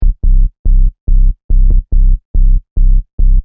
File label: Techno Trance Sub Bass Loop In C 142 Bpm